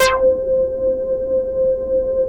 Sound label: JUNO 106; Masterbits; Vintage Voltage; SEQ-FX